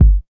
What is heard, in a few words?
smooth bass drum g key